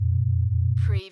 Space Scifi Sound - spaceship interior

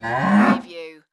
Cow Moo

